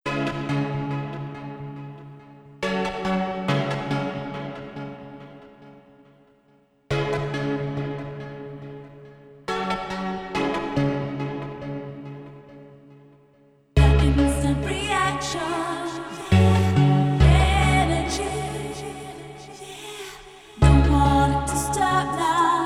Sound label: vocall chill 432 hz; feel energy